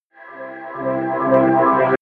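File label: happy bright rise, drones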